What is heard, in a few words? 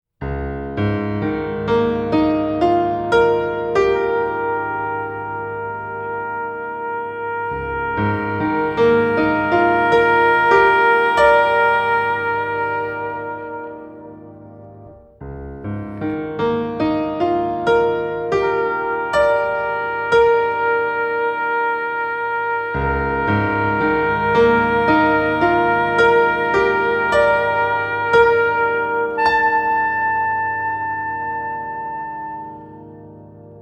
Soprano Saxophone and Piano; Suite for Soprano Saxophone and Piano